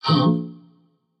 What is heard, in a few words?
confused defeat; babayaga; smite